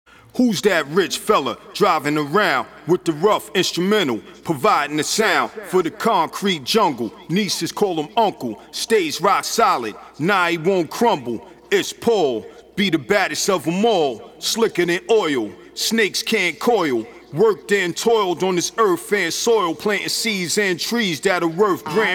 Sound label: acapella